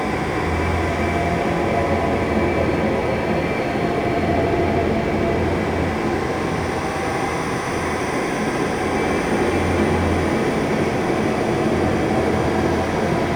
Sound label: Shipyards Interior Ambience; Warframe; Grineer Shipyards